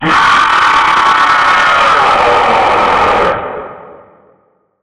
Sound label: jumpscare sound; fraf 2